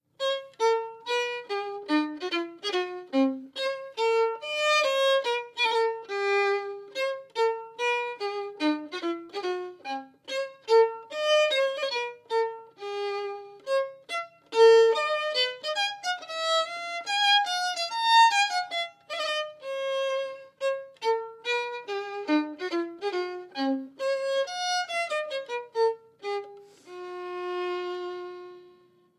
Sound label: Violin; Assassin's Creed Syndicate gamerip